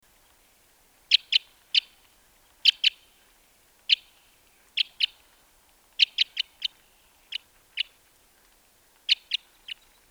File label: Cassia Crossbill, songs